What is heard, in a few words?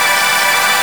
D5 LIQUID; Northstar; The Wizard VOL-1; FLUORESCENCE